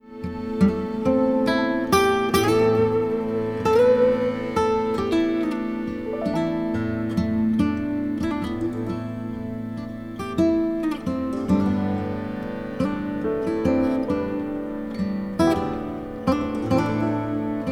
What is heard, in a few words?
Gibson advanced